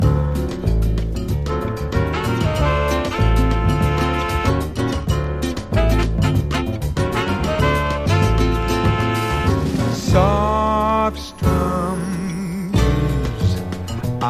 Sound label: soft strum blues; JAZZ VOCAL